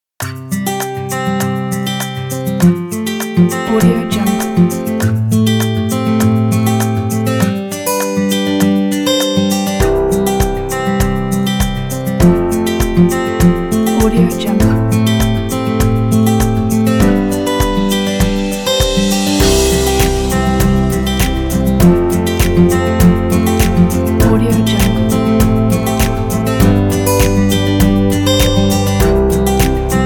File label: guitar folk